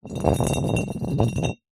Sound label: carboy demijohn roll hard ground; zapsplat